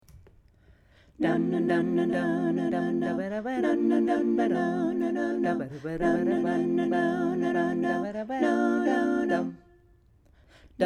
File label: Two Chord Ex Sop